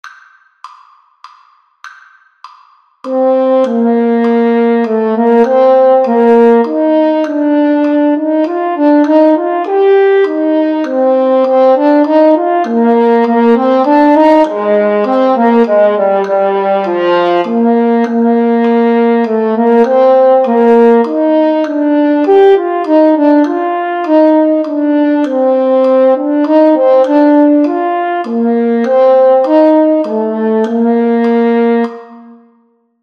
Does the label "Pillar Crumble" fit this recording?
No